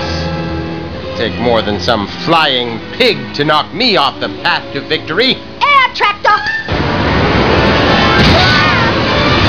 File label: tractor